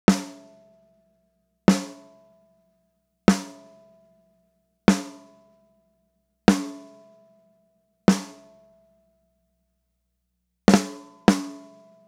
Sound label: snare drum fet compressor